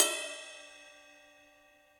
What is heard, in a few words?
Ride Dark Plate Verb; Drum Hits; Ableton; Factory Packs; Session Drums Club; MPE Hits